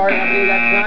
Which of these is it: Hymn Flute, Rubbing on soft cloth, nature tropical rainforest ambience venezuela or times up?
times up